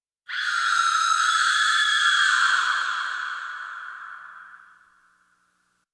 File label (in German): schrei mit echo; Katastrophen